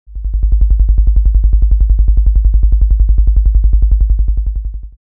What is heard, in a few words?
Wasp-Drum